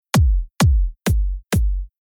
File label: Rhythm Dub Step Kick